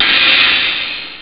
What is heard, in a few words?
hihat open; ST-XX Amiga Soundtracker Sample Packs